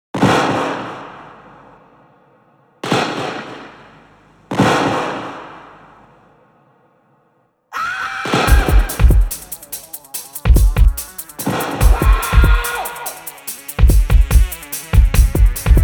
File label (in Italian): Rock ansiogeno